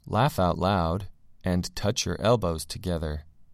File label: laugh out loud and touch